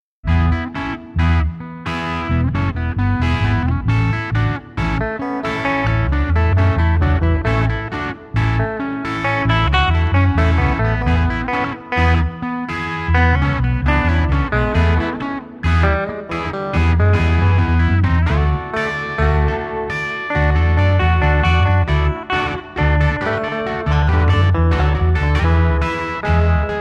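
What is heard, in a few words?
loop, posaune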